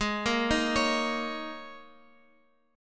Ab Suspended 2nd Suspended 4th Ab down Guitar Open F; arpeggiated; Absus2sus4